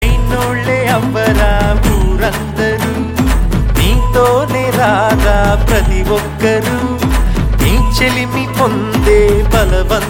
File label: Ringtone